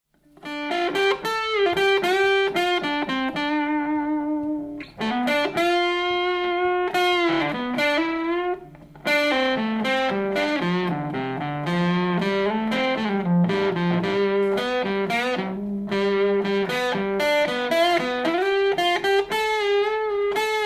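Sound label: woman tone, blues guitar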